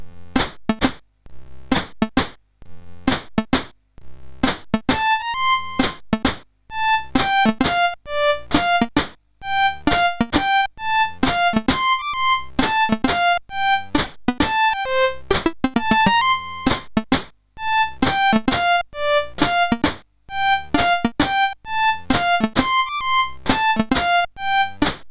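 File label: Waltz, MOS 8580 R5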